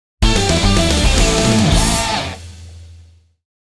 BATTLE CLEAR; Unchained

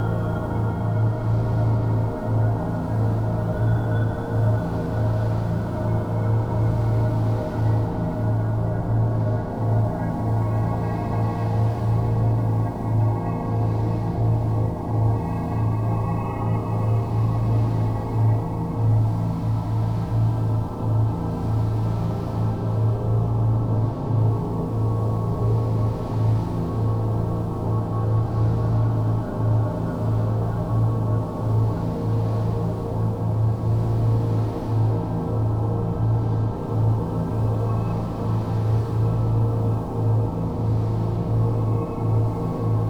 Ambient

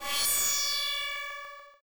SFX Death; Nikolai

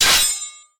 Block Melee; boomerang fu

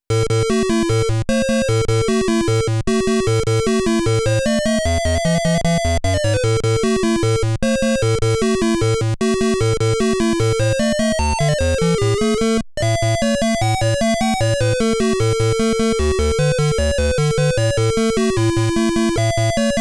In Game Music

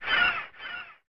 Seagulls; Cannon Fodder; SNES

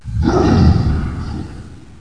spawn bloater

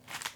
Panel Burger Lettuce Grab; Among Us

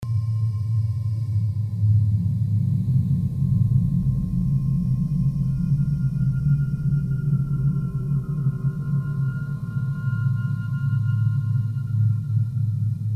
Heavy Containment Ambience

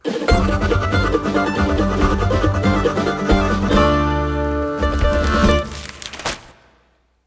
Newspaper Speed Record; Sid Meier's Railroads

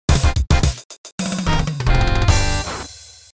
Victory; Quest for Glory 4

